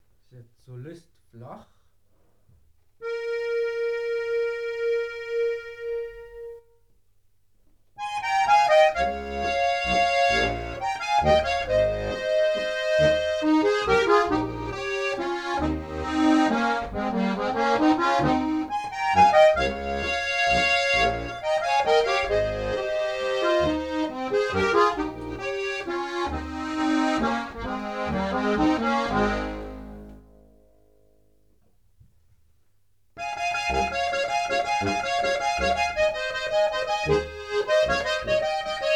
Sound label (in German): Tremolos, Solist flach